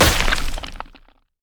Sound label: basic attack; lissandra skin01